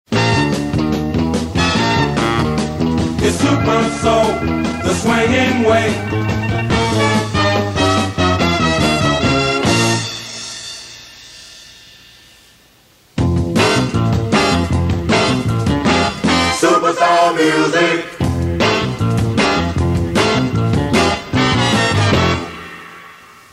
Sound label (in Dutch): ingekorte jingles